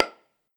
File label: Practice Pad